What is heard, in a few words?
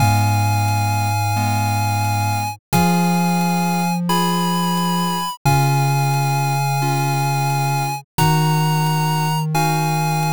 bgm mellow